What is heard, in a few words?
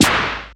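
Electro Magic, snare drum; Polyend; Legowelt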